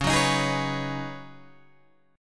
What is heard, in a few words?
Db 7th Suspended 2nd Suspended 4th Db down Guitar Open G; Db7sus2sus4 strummed